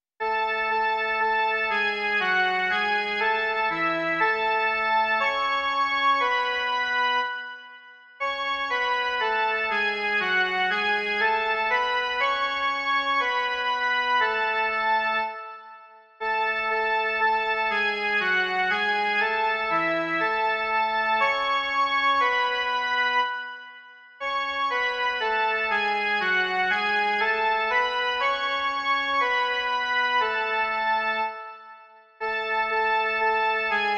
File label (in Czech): jen melodie; Svatý Bože lásky plný